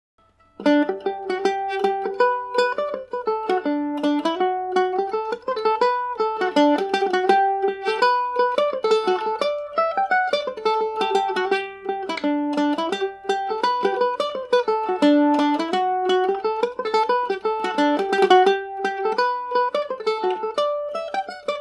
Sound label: mandolin; mills are grinding